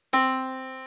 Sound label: Yamaha Disk, piano sound